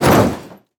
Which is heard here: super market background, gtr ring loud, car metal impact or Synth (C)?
car metal impact